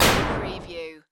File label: Retro Scifi Hit